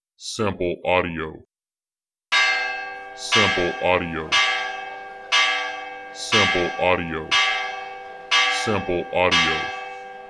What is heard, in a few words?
Church Bell